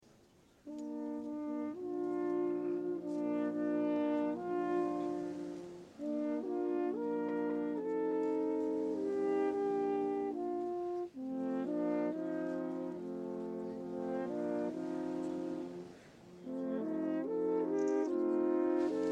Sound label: Quartet; Quartetto 3